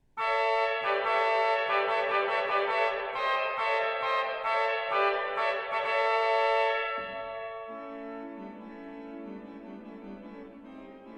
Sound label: fanfare, organist